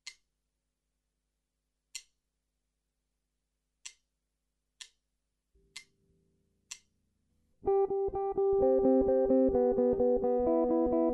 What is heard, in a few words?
guitar fingerpicking exercises jazz; fingerstyle tutorial